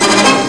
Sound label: beethoven; Computer Kids; Reading